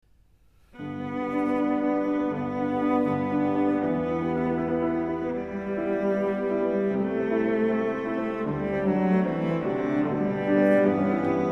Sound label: melody